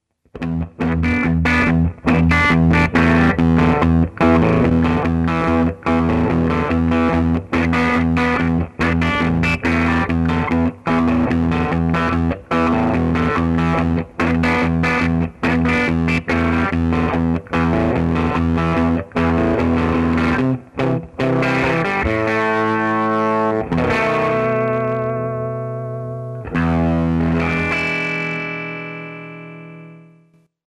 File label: broadcaster